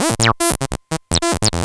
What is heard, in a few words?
saw